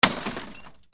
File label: BREAKING